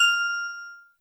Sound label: Key